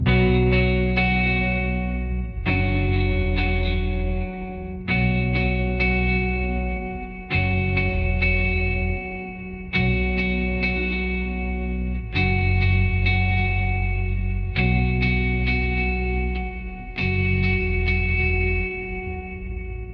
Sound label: ringtone pop